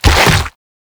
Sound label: Proto Infested Mutalist Speaker End Flesh; Warframe; Abilities